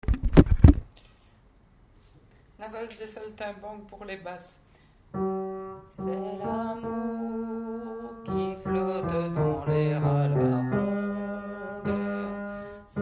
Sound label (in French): Operette c'est l'amour refrain basse refrain